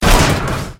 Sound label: throw; soundspvz